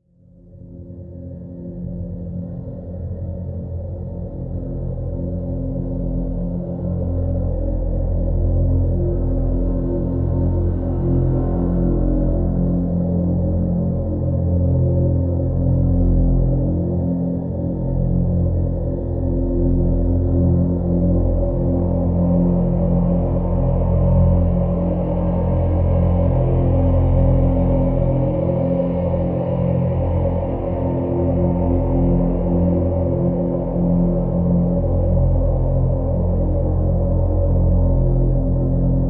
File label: Drn Gauntlet F